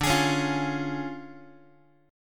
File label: D Minor Major 7th Flat 5th D Guitar; x,10,11,11,9,x; DmM7b5 strummed